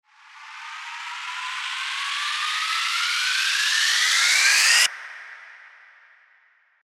RISER

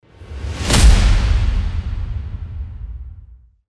IMPACT

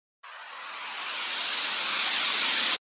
RISER

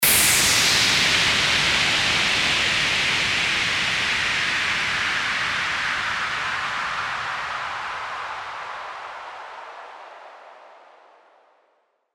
WHOOSH

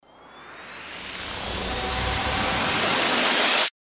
RISER

